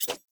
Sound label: Dagger Whoosh; Weapons; Enemy; Daggers; Sinecure Dagger